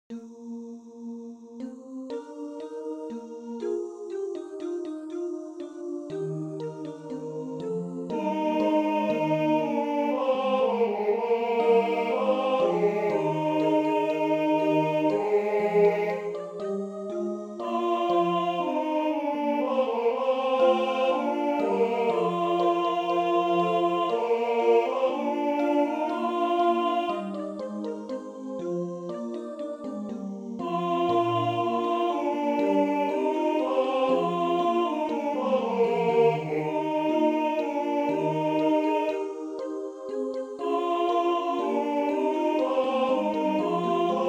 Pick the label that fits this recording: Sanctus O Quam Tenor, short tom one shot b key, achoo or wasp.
Sanctus O Quam Tenor